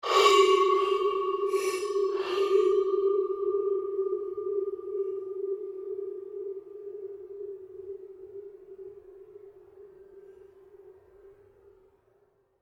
Created Pulse Fade Long, Rise And Dive Sound Effects; sound building blocks created through manipulation of raw material